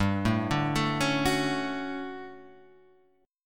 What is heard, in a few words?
D-Major 7th G; DM7/G Chord {3 0 0 2 2 2} arpeggiated